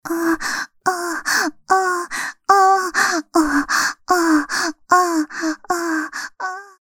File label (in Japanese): 喘ぎ声; echioto